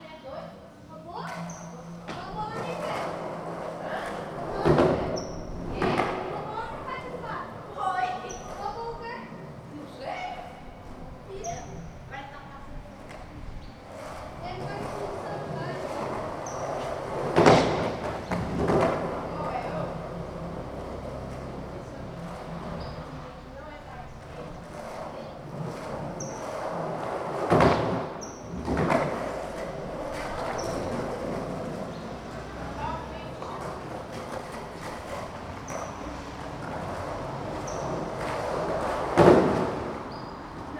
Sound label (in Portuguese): Ginasio de esporte crianças de skate; esportes